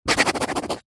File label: pen rollerball sign signature paper pad; office